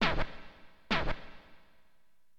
scratch; LiveAct Pool

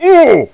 caveman; Pinball

